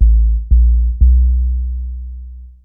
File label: Synth Bass; Basses